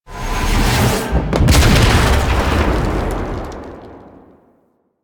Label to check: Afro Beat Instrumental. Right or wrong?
Wrong